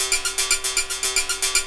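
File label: PCM FX 100 BPM; Rarefaction; Poke in The Ear With A Sharp Stick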